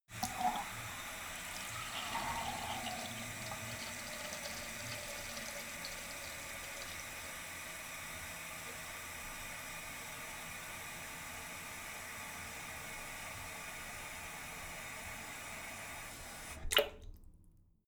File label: sink fill medium long